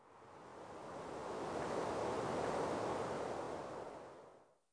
wind echo

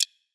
click

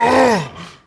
half ogre female hit; DAoC

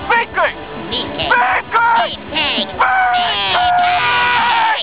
message

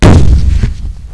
TANK FIRE

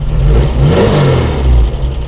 Car Engine Rev; Mechanical; The Complete Web Studio 2.0

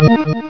Cleared Board; MacBrickOut 2.0.4

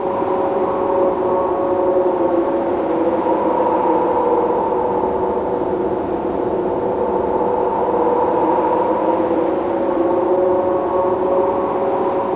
Wind Metal

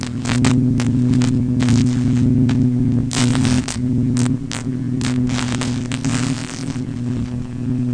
Electric Buzz Hum

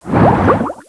stroke; SFX; PC Gamer IT CD 38; thief

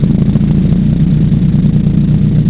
tread; TANK-OFF II

